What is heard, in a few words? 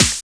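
SN, snare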